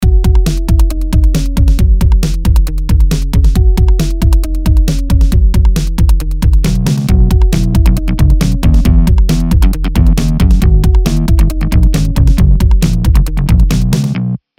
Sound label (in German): Beat Parkbank